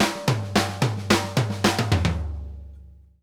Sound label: SNARE R, drum fills; Sampleheads - New York City Drumworks VOL-1